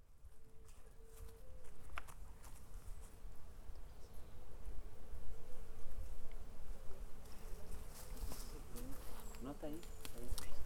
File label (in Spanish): OBSERVANDO COIPO HUMEDAL ACEVEDO RIO MAIPO; paisajes sonoros